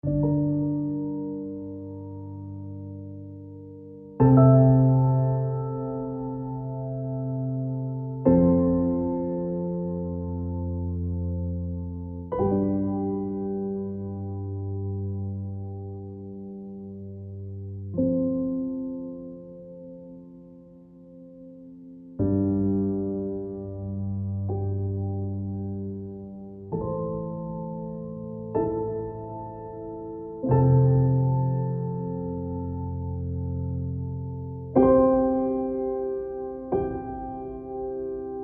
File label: only piano